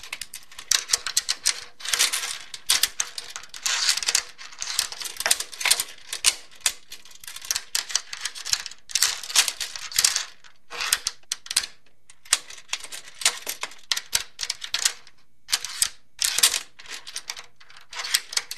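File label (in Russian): возня с отмычкой металлический звон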